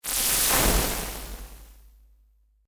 Laser Cannon SciFi Static Start, Laser Cannon Futuristic High-Tech Blast Static Buzz; Scifi Science Fiction Alien Gun Weap; Lasers (Sound Effects:Science Fiction)